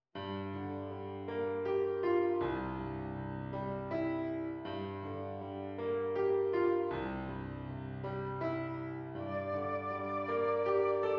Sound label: flute or oboe